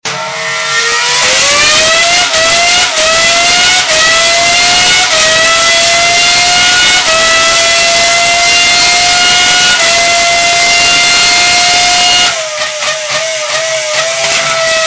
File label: F1 ACCEL Ringtone